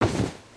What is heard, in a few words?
cloth